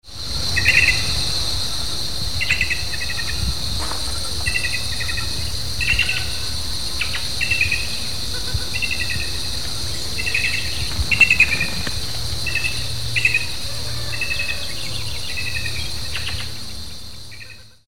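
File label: Urraca Común, Cyanocorax chrysops, Plush-crested Jay; cantos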